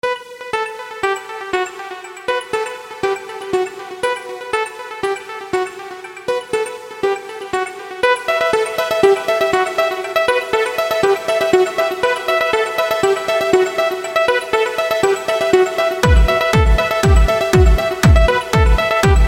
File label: Nokia Kick Ringtone